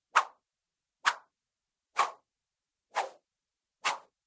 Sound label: Small Bamboo Swing Fast; noise creations